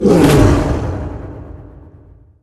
kings roar con impacto

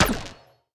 tower elven Stargazer rising Star impact

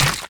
Enemy Explode Short

